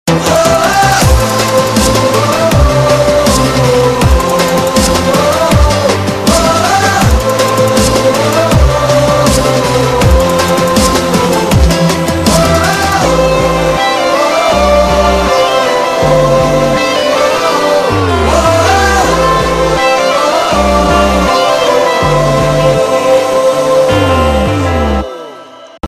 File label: Theme With Bass; Bollywood